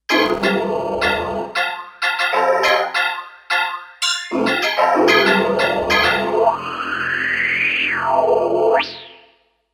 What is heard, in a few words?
Gas Leak, Kawai K5000S